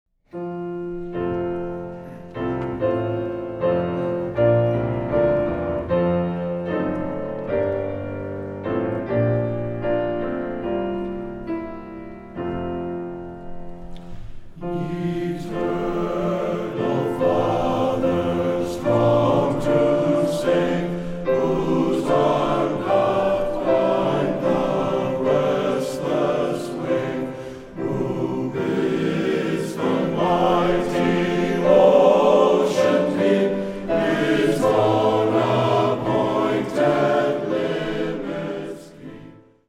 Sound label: Navy Hymn; Night Of Song 2009